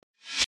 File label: new game sound